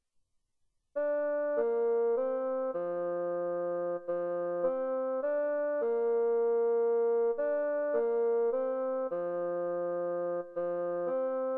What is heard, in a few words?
bassoon melody